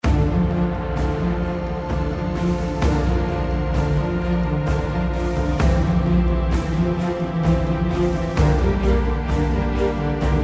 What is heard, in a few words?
Introduction Music; Tauren de Haut Roc